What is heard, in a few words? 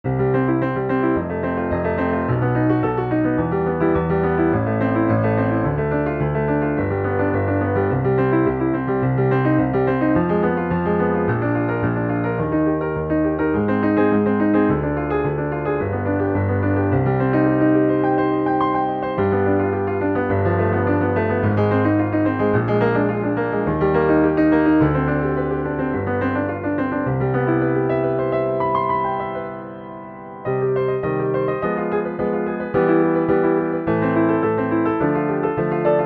A bit Bach-like, Pianoteq